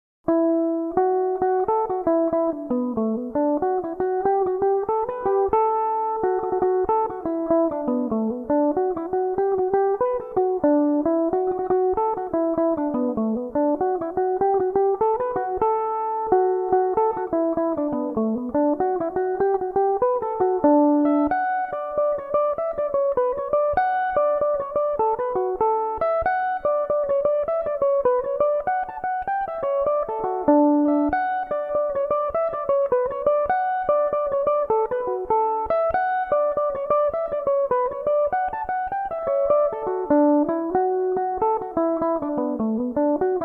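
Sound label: D major, Irish mandolin; Tonra's; Belmuse EM-190 electric mandolin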